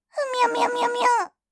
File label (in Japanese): デバイスの接続の失敗